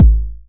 909 BD; Goldbaby Tape808 & Tape909; The Tape 909

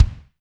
Kick, Alesis DM5

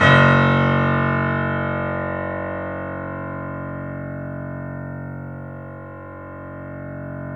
F#, The Piano; InVision Interactive - The Piano

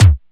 Kick, DIGITAL KIT

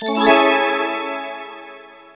FX HEAVE; AMG - Now CD-ROM (Roland); B.2.B. Fun

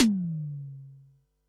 tom, Pearl SC-40 Toms

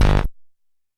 Tom Hi, Stylophone Beatbox

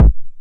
909 BD Tape Sat

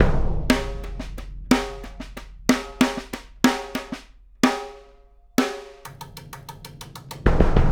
Big Drum and Snare; Sonic Foundry (Sony Creative Software); Essential Percussion; Drums; Big Drum Combos

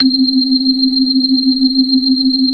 organ; Keith Emerson Signature Series

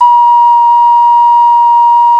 CLAYFLUTE, Heart of Africa

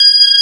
B3 Lo Dist Slow F#5

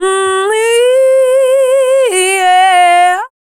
FEMALE, Dance Vocals

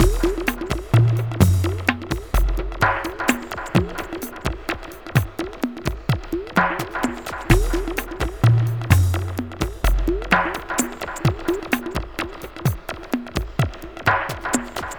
Ethnotronic, Processed Percussion

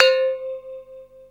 Bell; Zero-G Ambient 1; ECHO BELLS

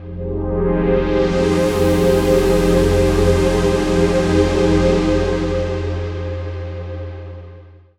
pad sweeper F

